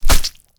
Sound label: icecream hit; Nick Summer Sports Stars